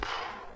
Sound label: smoke